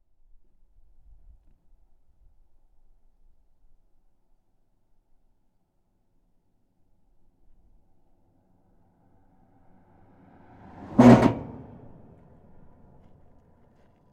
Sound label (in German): westl von Reykjahlid myvatn; Ristarhlid